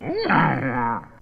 growl, Voice

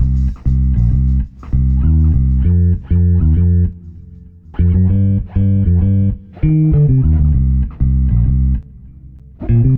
bass, loops; Phonky

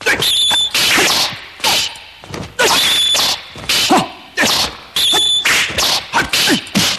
martial arts

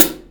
real closed hats

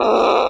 fart short

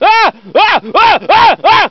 scream short yelps; Voice

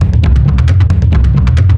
Drum loops; Just Add Drums

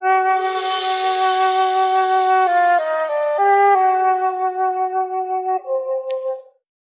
flute, Loops, Sad

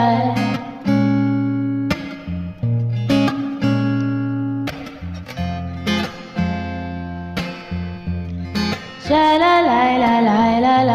guitar and vocal shalala, Loops, Phonky

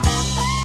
two notes

